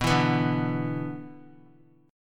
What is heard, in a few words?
B Minor Double Flat 5th B, chord; Bmbb5 strummed; JGuitar